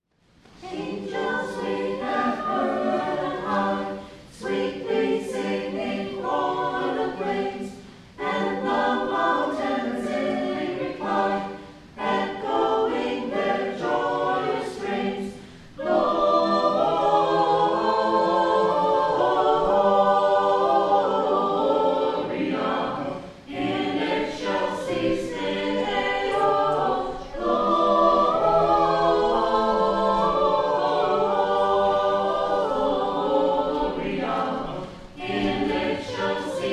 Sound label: Choir Angels We have Heard